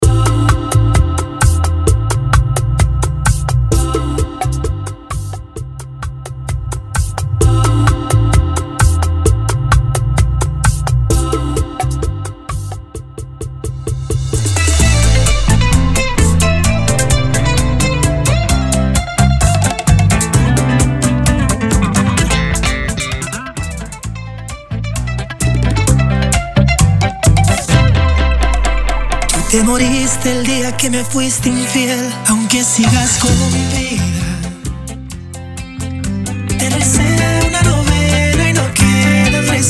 Intro Outro Break 130BPM

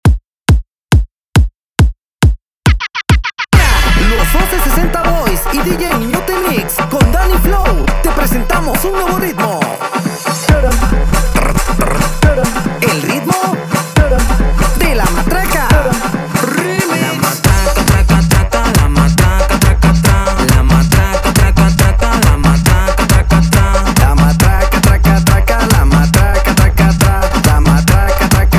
138 bpm

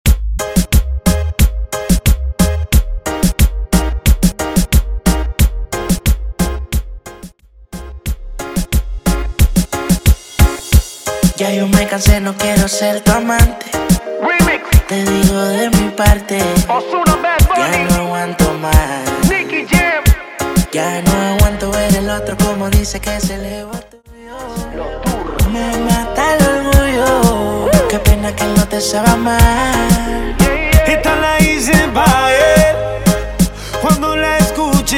Intro Outro 90 BPM

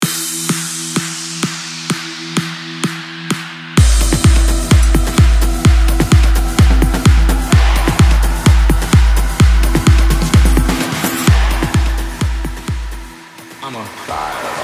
Mashup 128BPM

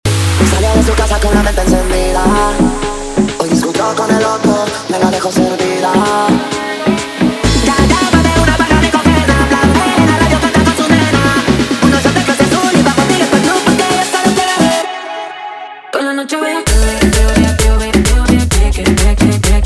intro outro 130 bpm

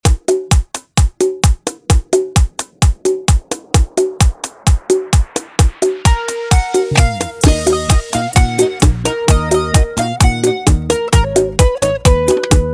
bachata 130bpm

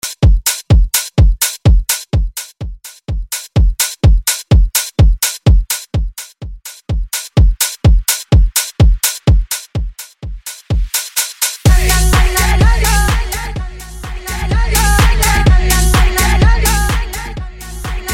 tech house 126bpm

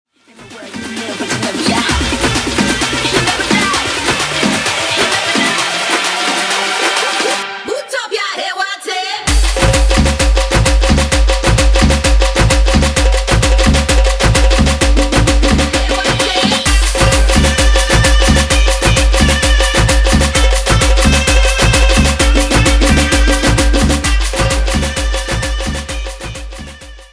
guaracha remix 130 bpm

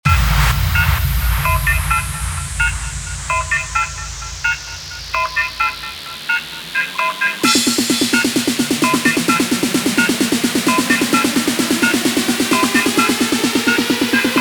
intro outro 130 bpm

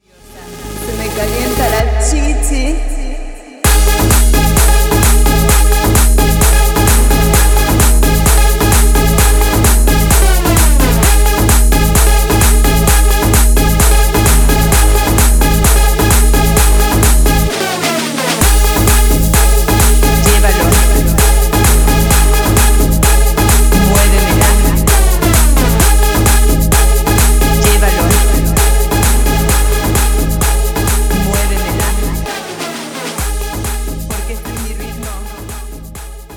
viciosa tribal remix 130 bpm

